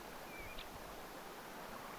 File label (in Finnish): kapytikka